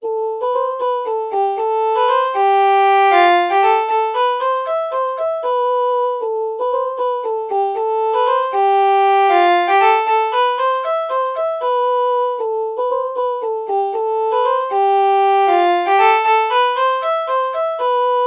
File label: oh reed organ false melody morph